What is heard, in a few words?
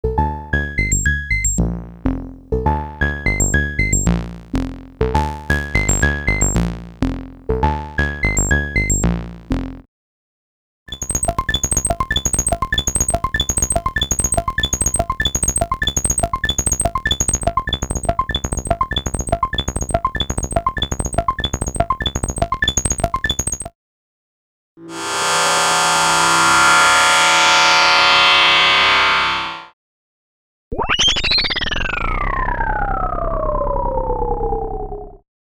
Moog Modular